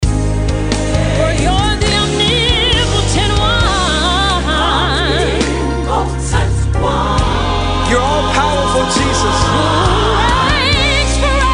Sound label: Gospel Medley